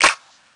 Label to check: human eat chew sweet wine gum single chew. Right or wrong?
Wrong